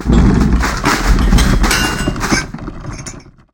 feature Pre Rumble; hellskitchen

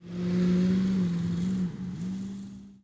amb pool; vegas night life

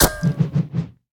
drive By Impact; narcos

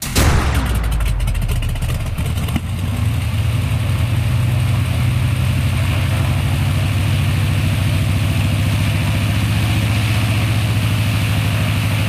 bomber Plane Start

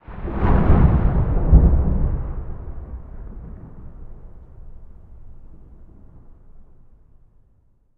thunder; halloweenjack

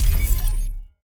wild Land; multiplier mayhem

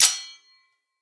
continue Click; conan

